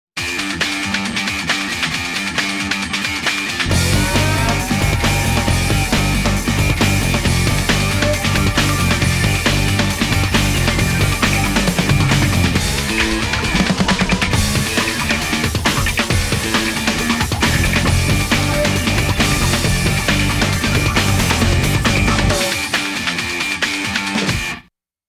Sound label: tekno rock